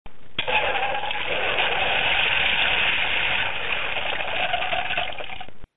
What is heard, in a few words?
flush